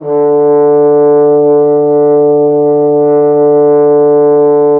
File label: horn sustain